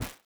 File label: step sand